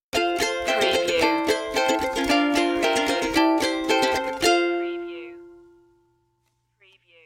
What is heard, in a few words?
carnival stinger